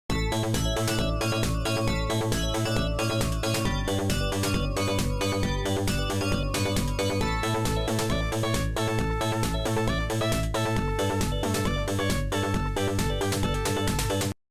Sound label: Stage Completed!; Suzy Cube